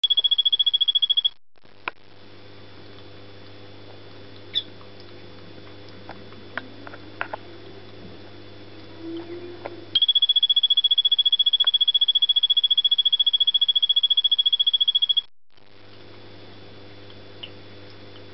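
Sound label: Phyllobates vittatus calling male, frog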